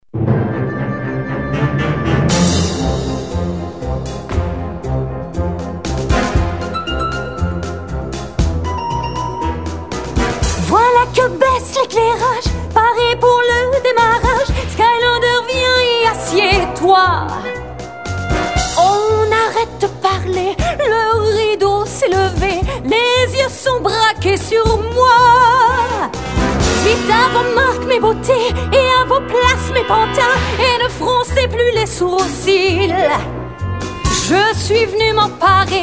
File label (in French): chant enfants, dessin anime; skylander